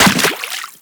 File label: Run Water; Footsteps; Warframe; Teshin